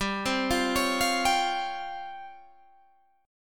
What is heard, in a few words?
C sharp Major 7th Flat 5th, chord